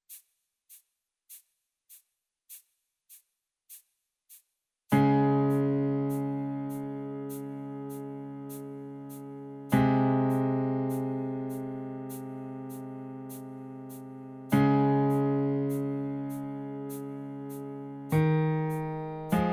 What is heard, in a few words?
Bass